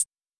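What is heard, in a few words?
HH Closed, Roland TR-77; Drums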